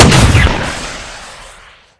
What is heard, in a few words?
rocket Launcher; blobAndConquer; combat